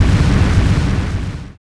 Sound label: ROCK